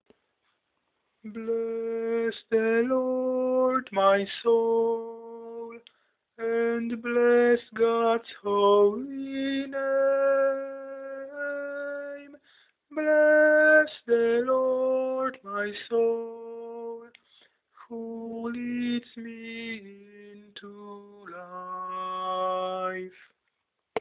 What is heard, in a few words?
Bless the Lord tenor